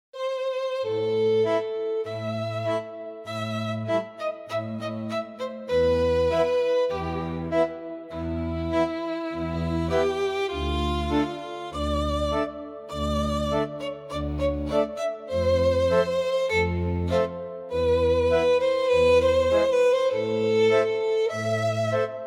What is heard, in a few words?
Dramatic sad meme emotional damage